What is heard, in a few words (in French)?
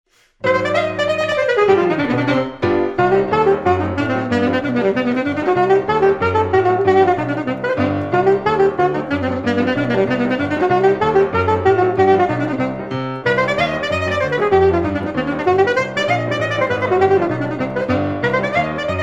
Danse, saxophone et piano